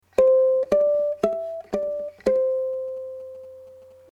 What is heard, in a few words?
harmonics, ukulele